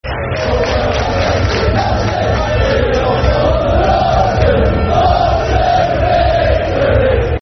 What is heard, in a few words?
We All Follow The Rochdale, Football Chant (Soccer Song)